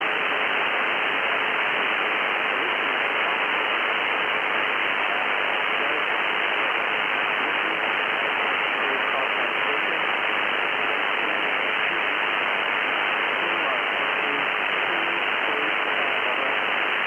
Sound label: 14487KHz